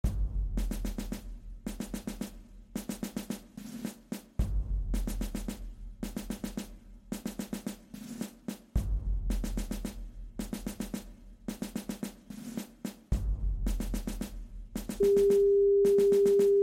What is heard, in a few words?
Commando Drum